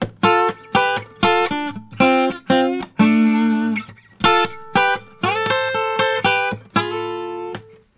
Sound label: Blues Guitar Samples; ILIO; Fretworks; LICK